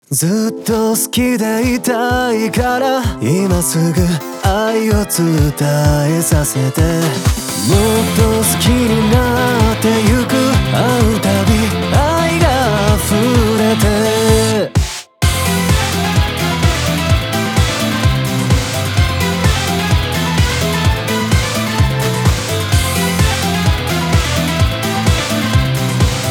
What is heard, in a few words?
vocal